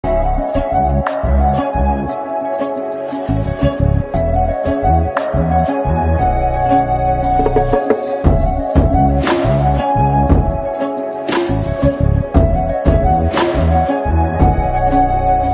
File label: Instrumental BGM